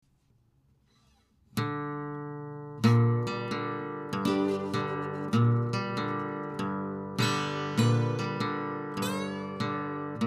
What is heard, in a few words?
slide stuff